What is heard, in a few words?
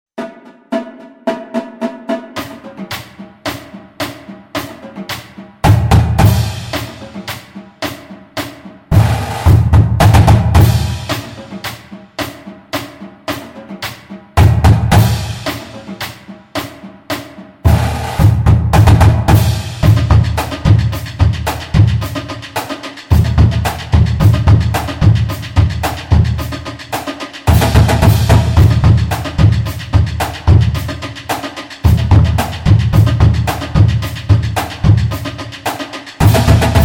bumper cadence